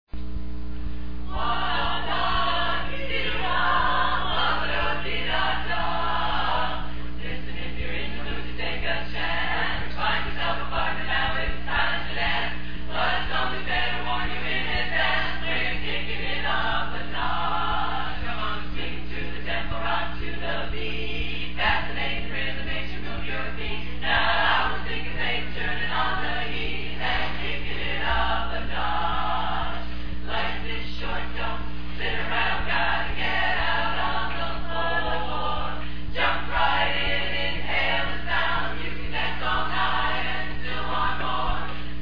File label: Chorus; conventions